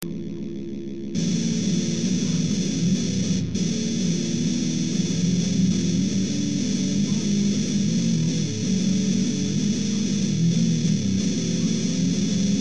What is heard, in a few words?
riff